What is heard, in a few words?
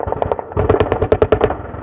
city battle, streetwar